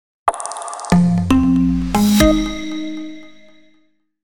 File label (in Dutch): Logo met oploopje